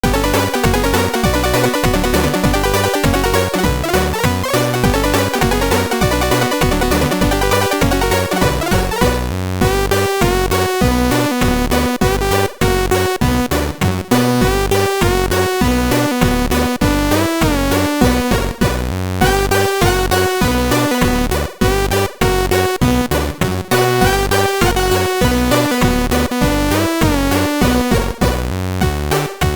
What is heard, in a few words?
scary sawtooth